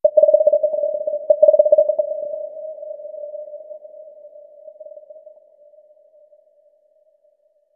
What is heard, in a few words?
Forest Amb Rand; moul